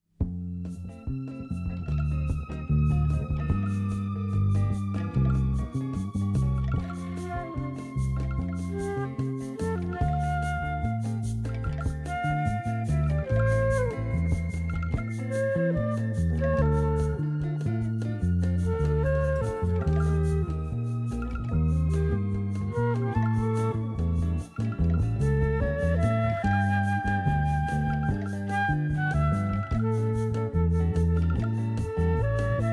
flute and skin

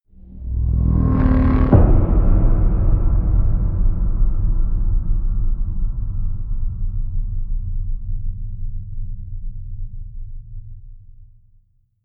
hit; brand x music

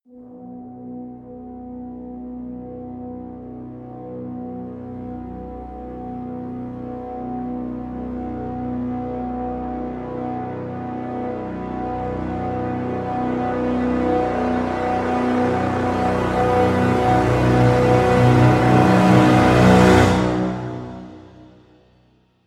cres brass swell; brand x music

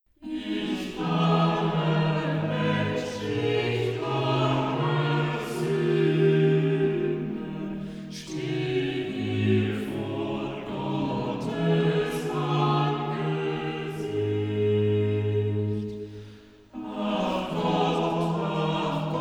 chorale cantata chorus; wer nur den lieben gott labt walten bwv 179

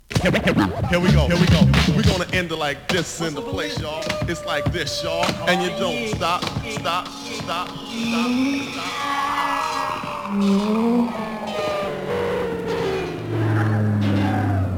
outro instrumental

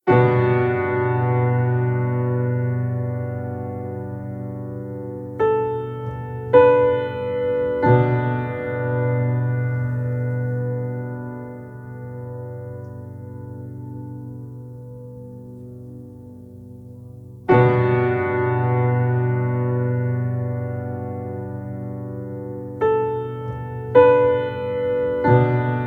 piano and ambience

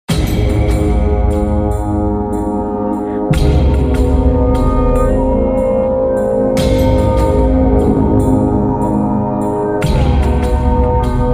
ambience music

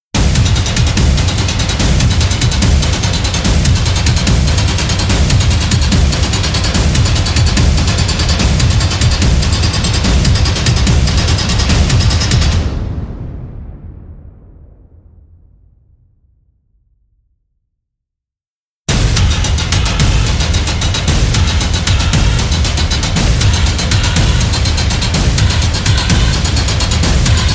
builds war metal

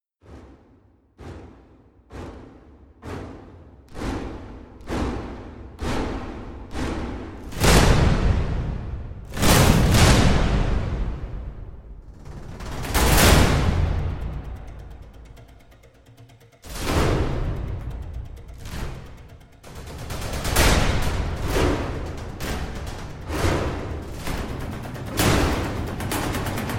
drums

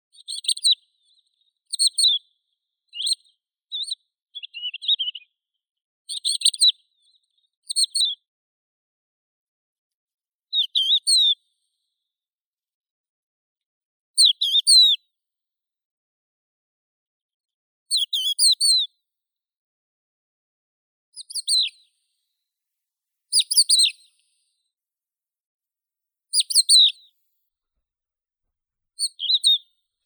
zhavoronok hohlatii galerida cristata

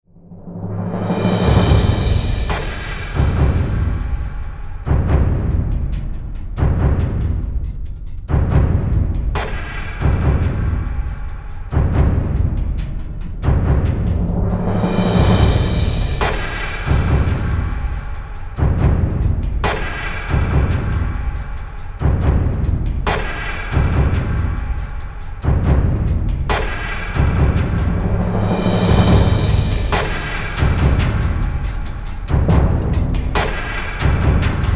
drums and percussion

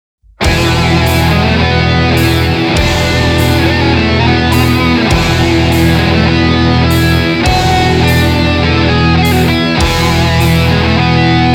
instrumental

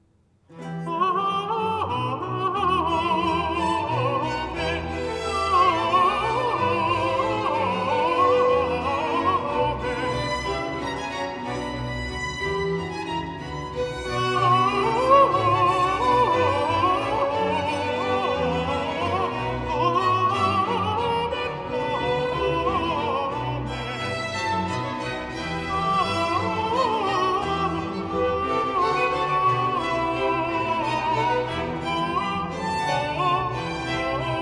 Stabat Mater for alto solo amen

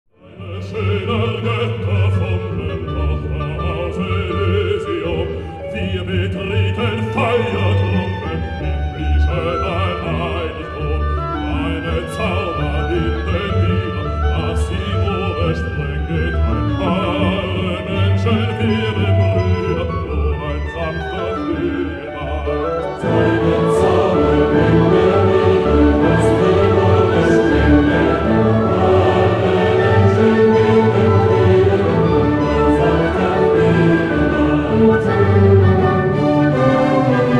symphony 9 in d minor chorale presto; op 125

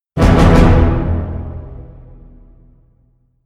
hit orch tutti; triple e

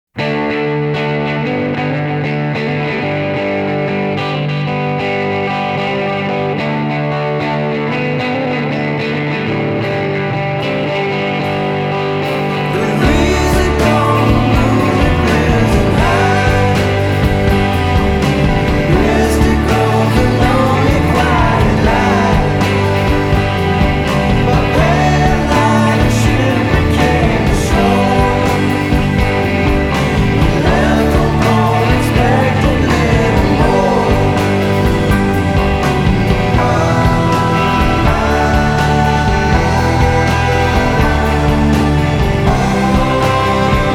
two birds

